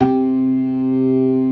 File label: CLASSIC C3, Synth and Organ